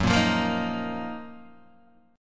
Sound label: Gb Major 13th, Guitar, Open D; chordshape; down